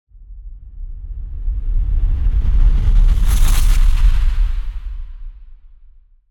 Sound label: Whoosh Sci-Fi Heavy Whoosh; Mechanical Wave; Cinematic Feel